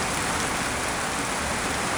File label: rain; Soldier Of Fortune II Double Helix; XBOX; ambience; nyc